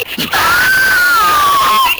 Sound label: error; MBHaxe